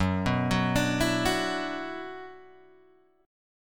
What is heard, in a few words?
Gb 7th Suspended 4th Sharp 5th, Guitar Open G; chordshape; down